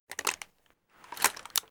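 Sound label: Weapon shotgun jam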